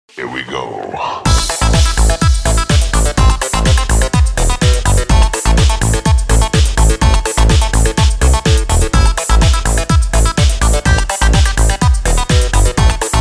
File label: disco dub